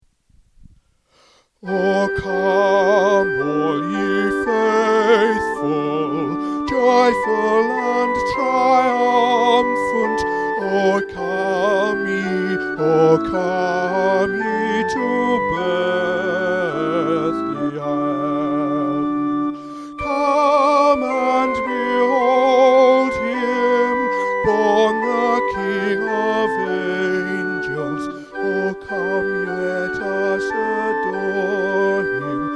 Soprano; O Come All Ye Faithful

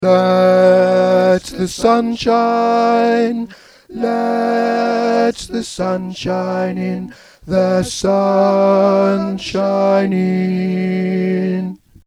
Tenor Voice

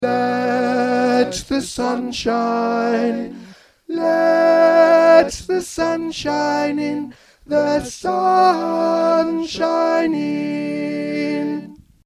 Soprano 1 Voice

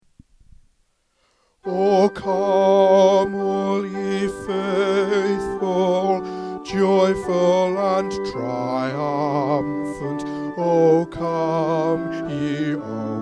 O Come All Ye Faithful Bass

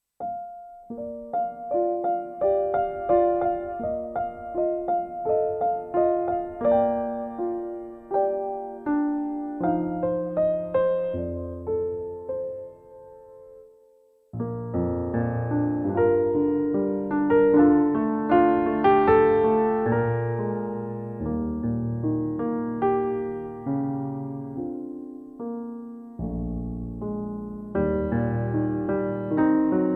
Backing Track

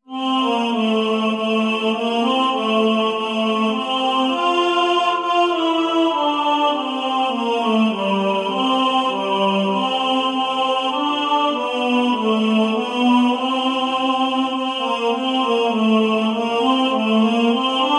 Tenor; Away In A Manger

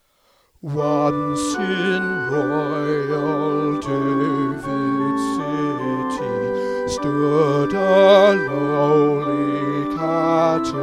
Once In Royal Davids City Alto

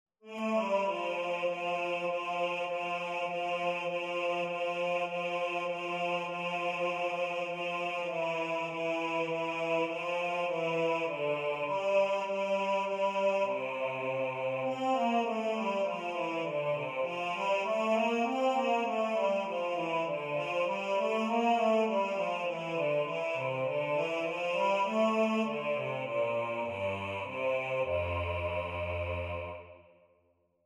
Away In A Manger Bass

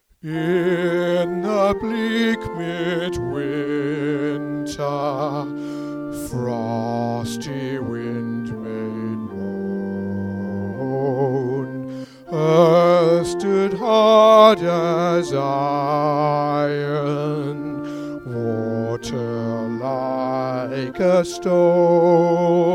In The Bleak Midwinter Bass

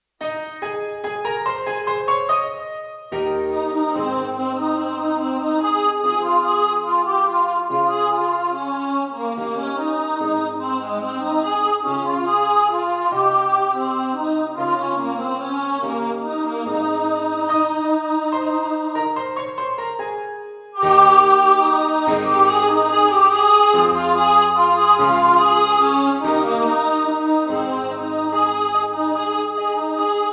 Simple Gifts Alto

